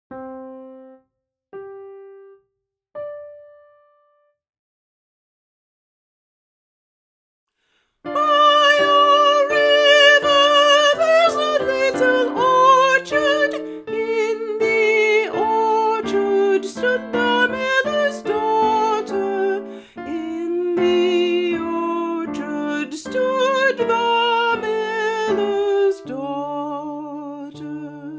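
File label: Soprano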